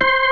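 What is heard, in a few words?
C5 fast power, power B3; Northstar - New Gold VOL-1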